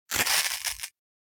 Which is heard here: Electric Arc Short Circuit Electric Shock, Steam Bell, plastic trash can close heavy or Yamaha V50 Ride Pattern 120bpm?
Electric Arc Short Circuit Electric Shock